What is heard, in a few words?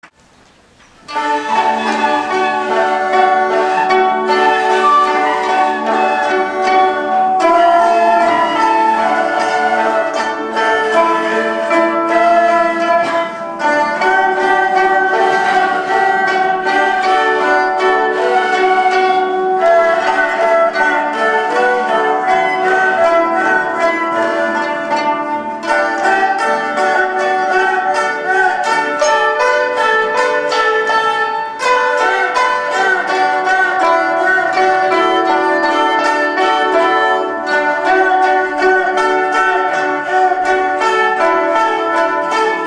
Folk music of E Lun Chun